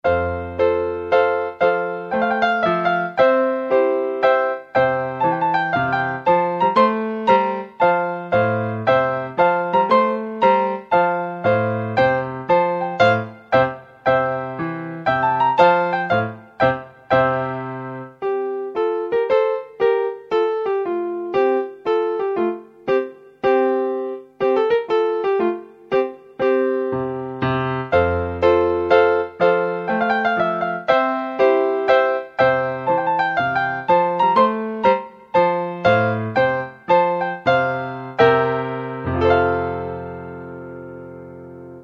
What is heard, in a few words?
courante, piano